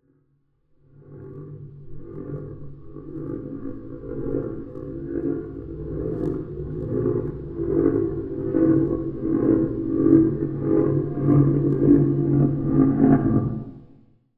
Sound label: sound design droning pulsating resonating dark tone